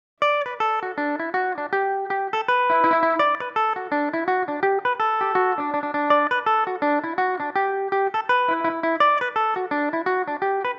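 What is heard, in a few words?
D major, Irish mandolin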